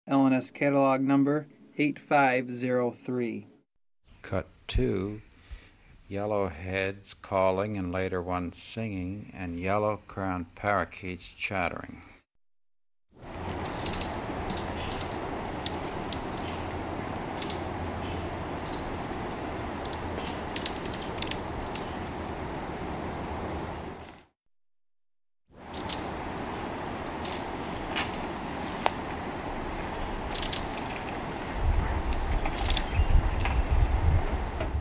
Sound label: yellow fronted parakeet